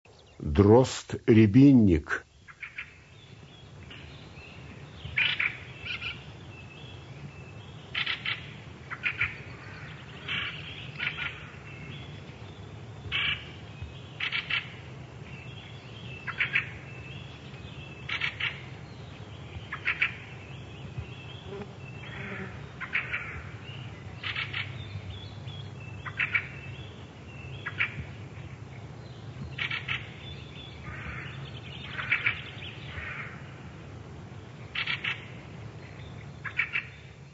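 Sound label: fieldfare Turdus pilaris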